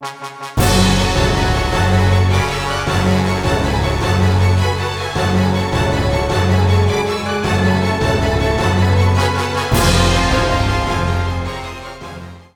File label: Dramatic